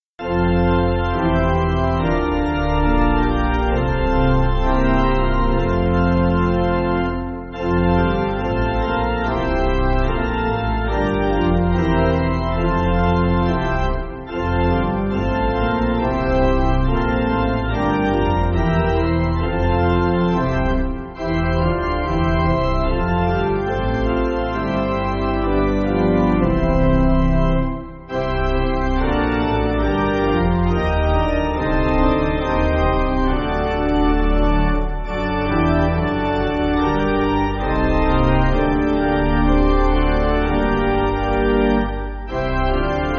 Pipe Organ, Backing Files for Churches

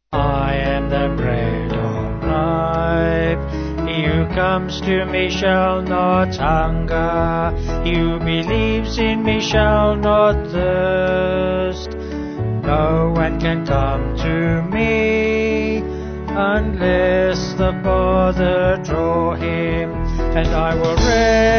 Vocals Band

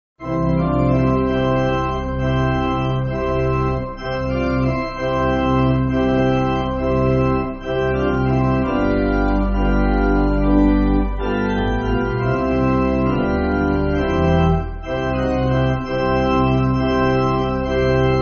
Pipe Organ, Backing Files for Churches; Beautiful Robes; B.E. Warren

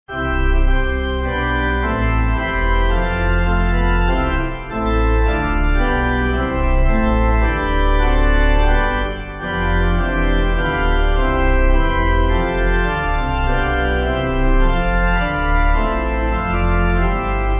Pipe, Pipe Organ; Author Of Faith; Mainzer

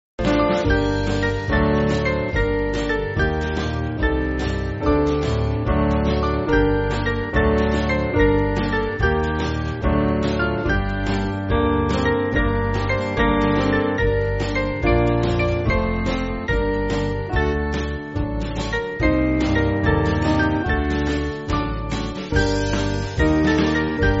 Band Faster

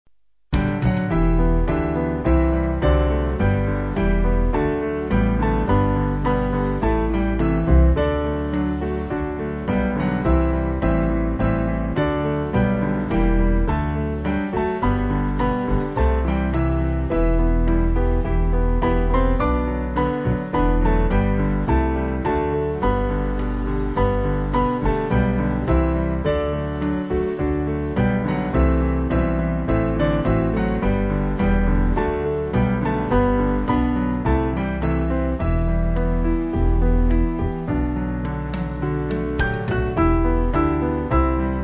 Band, Music Backing Files for Churches

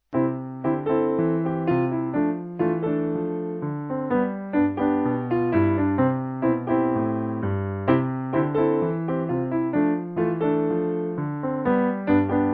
Calypso Piano

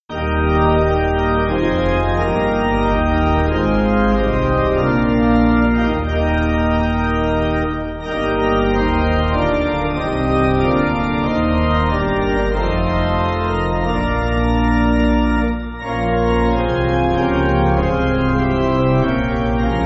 Pipe, church music

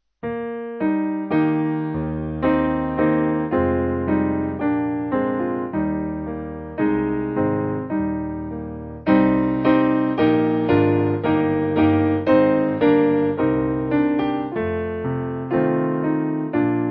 Piano

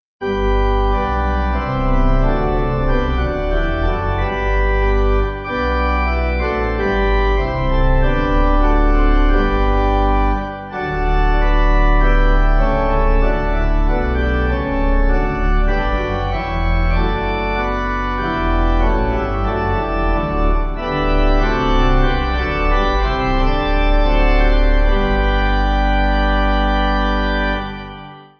Pipe; Angels and ministers, spirits of grace

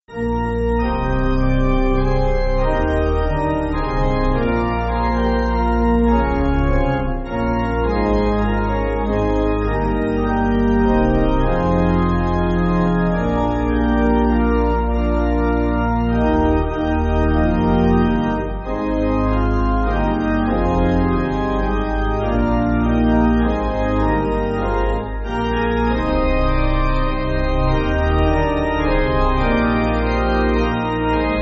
Pipe Organ, Music Backing Files for Churches

